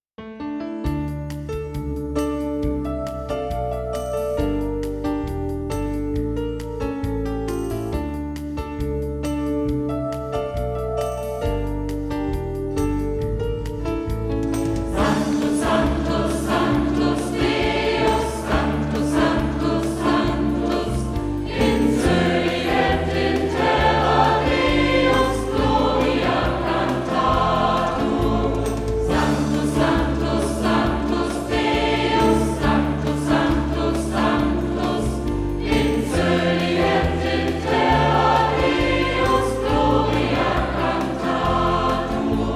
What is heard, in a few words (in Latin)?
Sanctus live; Akzente